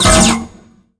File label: tank hit small interior